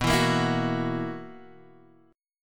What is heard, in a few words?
B Major 7th Suspended 4th B